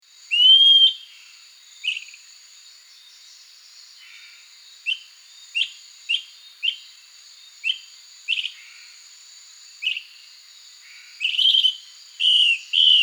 Tyran janeau Lesser Antilean Flycatcher, chants des oiseaux; Guadeloupe